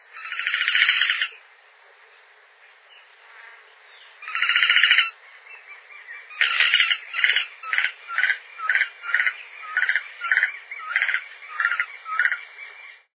pavaozinho-do-Para

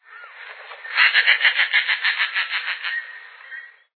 caramujeiro

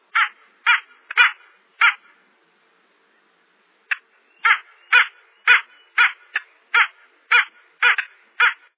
corta-agua

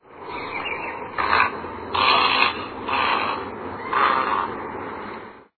garca-branca-grande

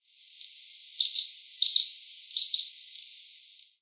ferreirinho-estriado

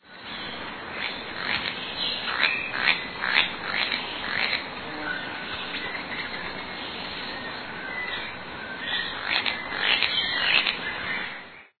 anambe-branco-de-rabo-preto

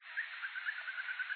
arapacu de garganta amarela

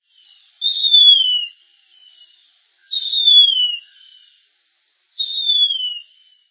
gaviao real

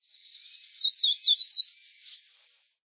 pula pula

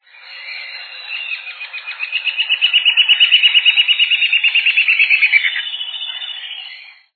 papa formigas de sobrancelha

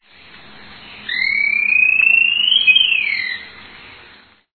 patinho de coroa branca

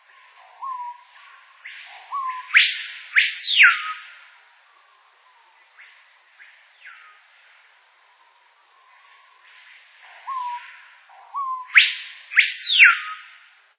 cricrio

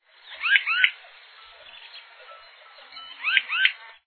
batuira de esporao

